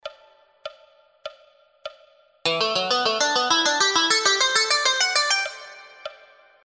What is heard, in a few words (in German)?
SCALES Quarten